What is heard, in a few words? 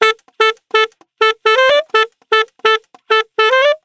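Bass Mute; Disco House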